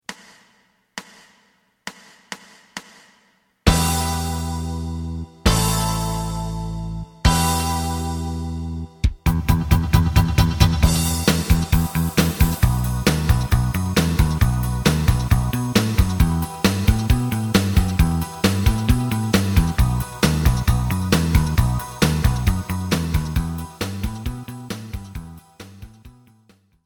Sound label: lead